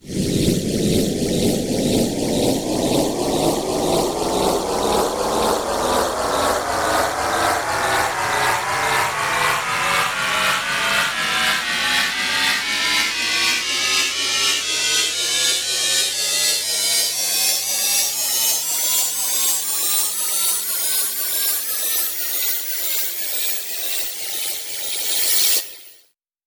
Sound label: reverse cymbal; tonleiter